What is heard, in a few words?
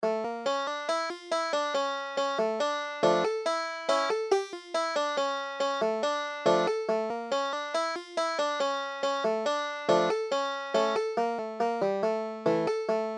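tanz tanz yidelekh, banjo, Klezmer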